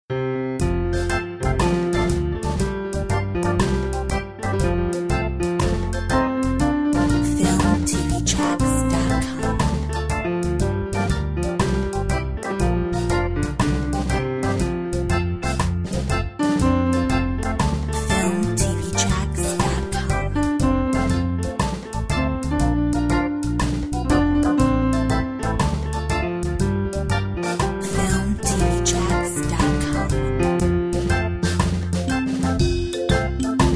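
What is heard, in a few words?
Auld Lang Syne Reggae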